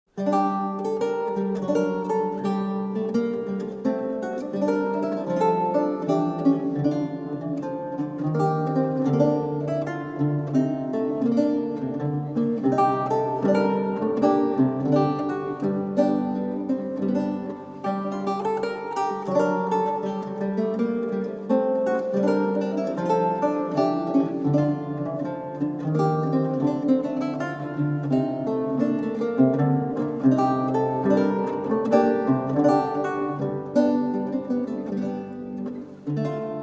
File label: Passion Galliard, Lute music; John Dowland; Lute songs